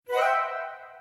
match; jewelpetsmatch; jewels

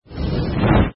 fire invoke; inlogic-castledefense

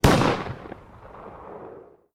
shotgun; ninjarun

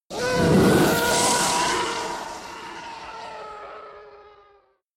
tie noise; star-wars-rebels-team-tactis

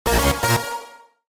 win; ColorPin

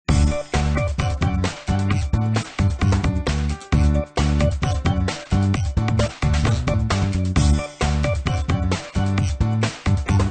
game music; Match 3 Animal

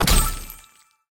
match; YummiCookie; French

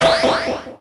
powerups drone activate; spider-man-mysterio-rush-game

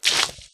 car puddle splash; lightning speed

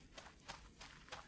run; star wars rebels team tactis; ezra

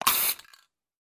ui btn next colour; lightning speed

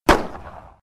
pistol; ninjarun

